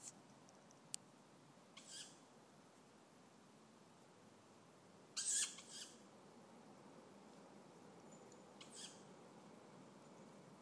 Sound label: night time bird squeak